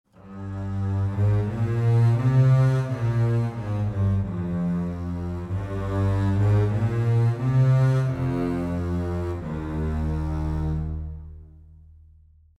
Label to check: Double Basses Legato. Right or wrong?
Right